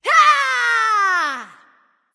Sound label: battle cry; Smite; athena